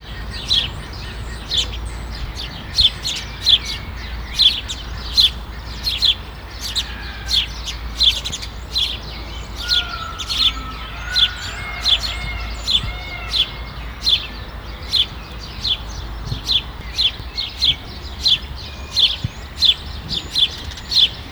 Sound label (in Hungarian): veréb, házi veréb; állathangok; nyíregyházi zoo 2010